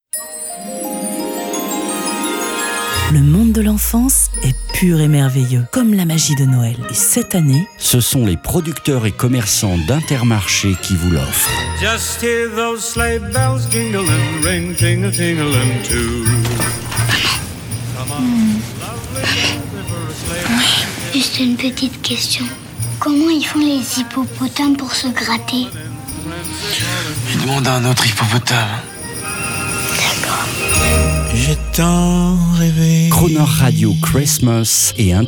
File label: radio christmas multiband; intermarche